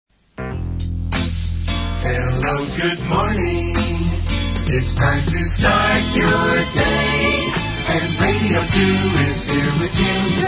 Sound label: jingle